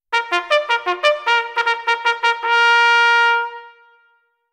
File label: Quicken Pace aka Gallop, bugle; c1880 Cycling Bugle; henry keat & sons cycling bugle